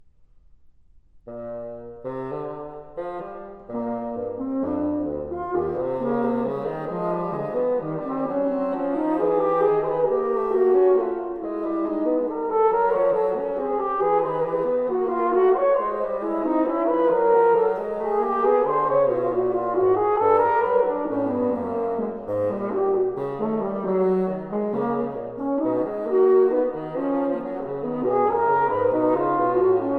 Duets For Horn & Bassoon; Twelve Duets For Horn & Bassoon - II.

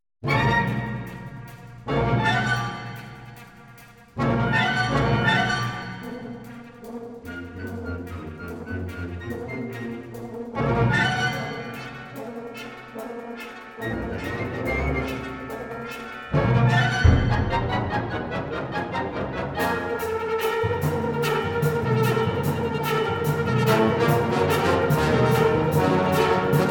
Wind Symphony

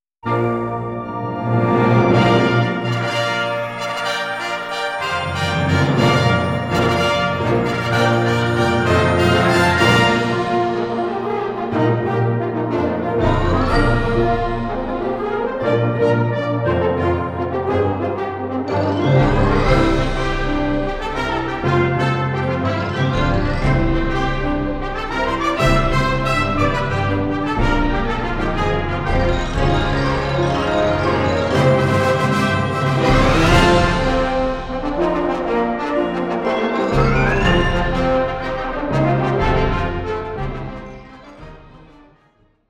Gran Fanfarria

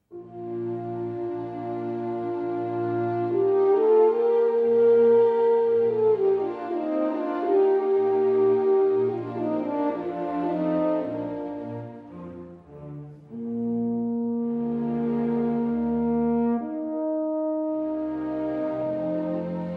Horn Concerto, Romanza; Mozart

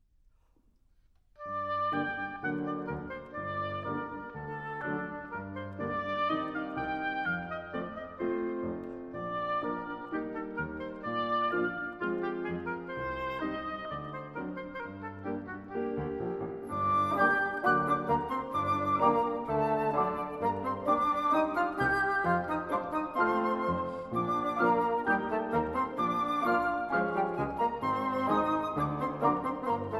Sextet In B-Flat Major, Andante quasi Allegretto

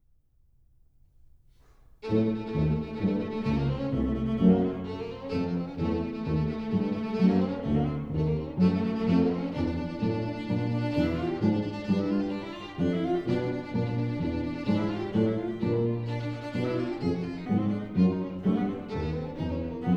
Quintet for Tuba and Strings Allegro mysterioso

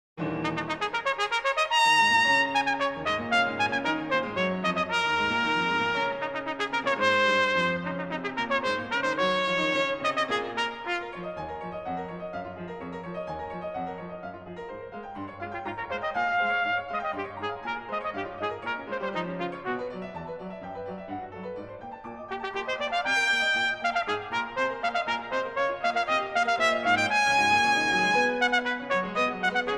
Sonata For Trumpet & Piano - Mvt. 1. Agitato

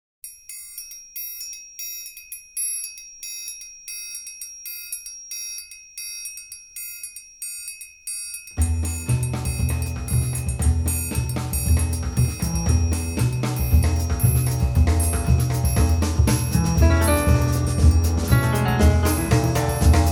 Bright Blues

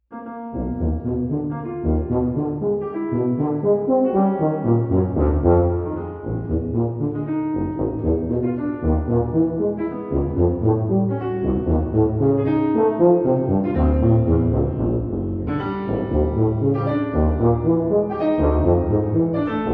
Sonata For Tuba And Piano, Scherzo

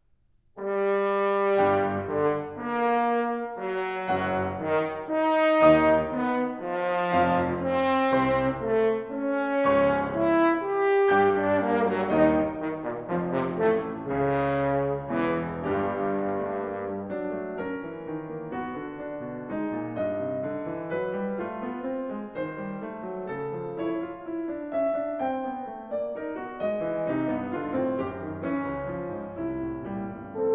Sonata for Horn and Piano, Determinato

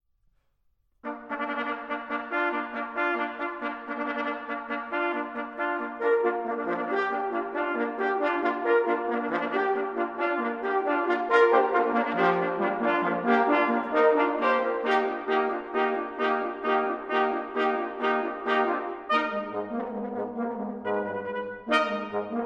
Five Engravings in Brass, Sinfonia de caccia; Incisioni

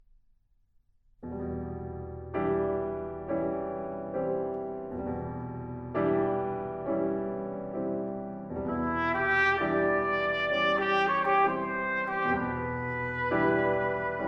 Vocalise, Trumpet Vocalise

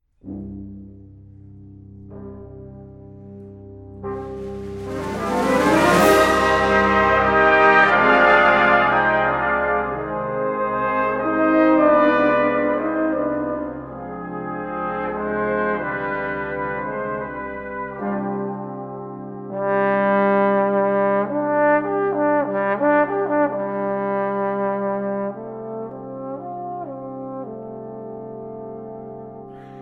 Concertino For Band and Trombone; Bone-a-Fide Brass

